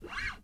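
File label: SB SCRAPE, FUNK BASS; Northstar - New Gold VOL-1; SPEC.FUNKBAS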